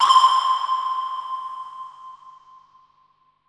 Tonal FX C

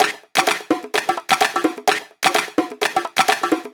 Percussive Loops 128BPM; Vengeance Samples

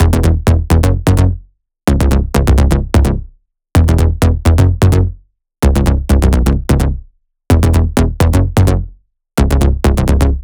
Melody Kits 128BPM Root C#; Vengeance Samples

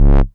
Bass Multi Rev C#; Vengeance Samples

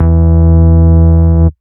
Root E, Bass

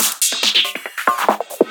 Loops Fillin140; Vengeance Samples; VEC1 Fills

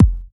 Bassdrums

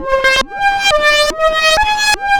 Roland C Line, Roland S100

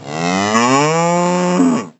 FX Athmosphere; Vengeance Samples

